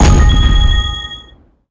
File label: Bonus Display; BOMBERGIRL RAINBOW; Arcade